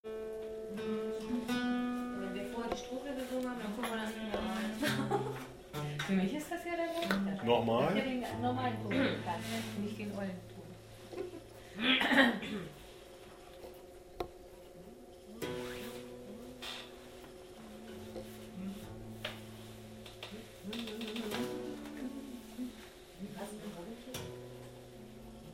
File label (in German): Gesang refrain Ende; es zogen einst fnf wilde schwne